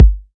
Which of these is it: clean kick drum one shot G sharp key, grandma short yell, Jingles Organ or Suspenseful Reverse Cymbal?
clean kick drum one shot G sharp key